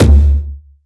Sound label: high tom single hit d key, tom single hit tuned to the D note. Loudest frequency: 879Hz; tom drum